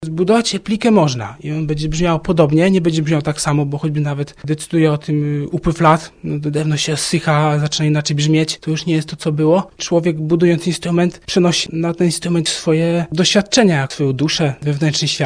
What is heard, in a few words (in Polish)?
klawikord